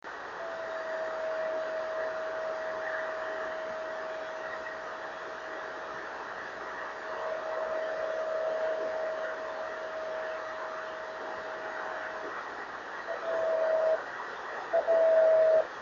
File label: 26880KHz